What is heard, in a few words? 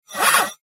Slide Movement Multimedia; Slides (Sound Effects:Objects)